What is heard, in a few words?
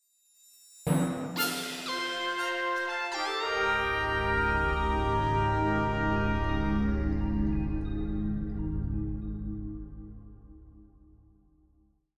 Landing in a New Area (Long); Pikmin 3